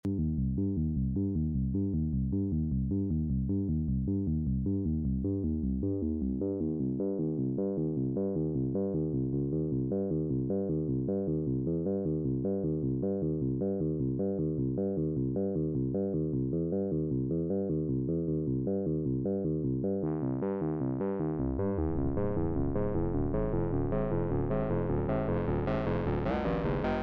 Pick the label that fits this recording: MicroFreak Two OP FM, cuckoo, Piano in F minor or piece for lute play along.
MicroFreak Two OP FM